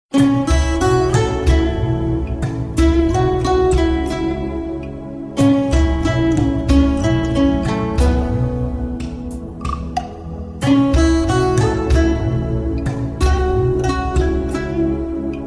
bgm; 7th senes

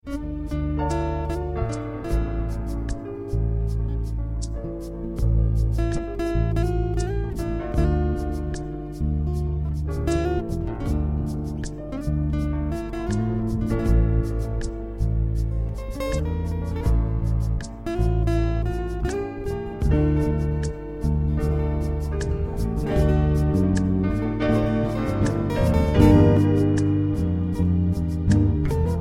soft guitar

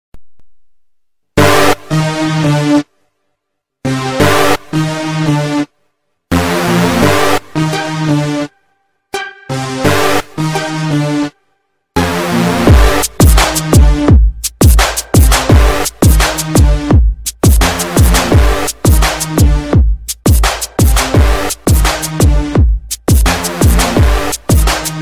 gangsta beat

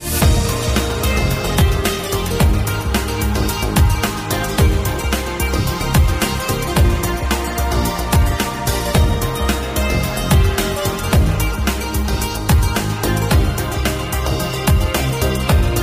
chillout remix; central park

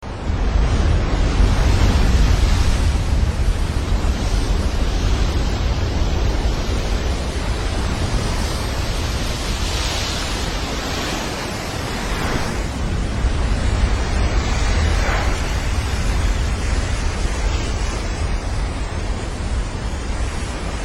tsunami waves

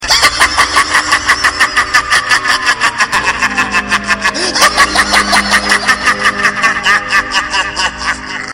joker laugh

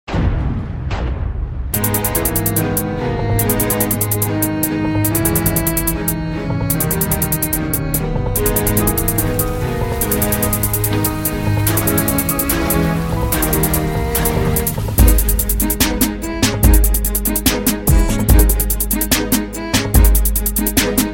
riddim instrumental

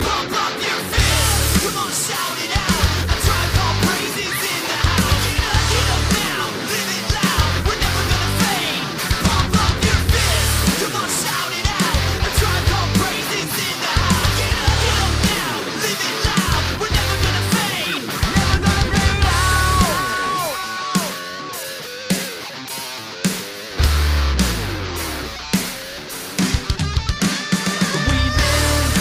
audacious bounce

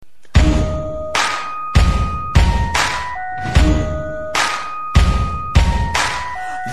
rock msg

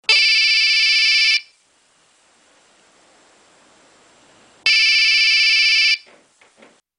low tone; nokia

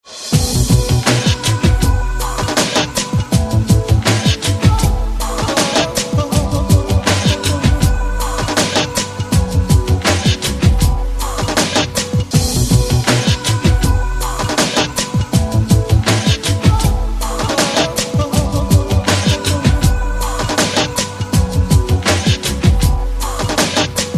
instrumental; murder2

